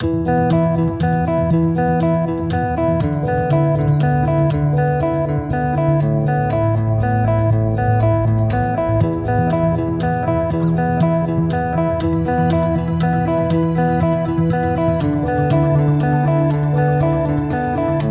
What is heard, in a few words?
corporate success